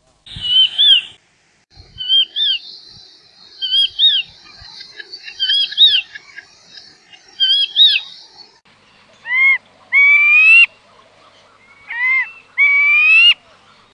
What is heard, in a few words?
quail brown, bird calls